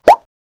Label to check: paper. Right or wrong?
Right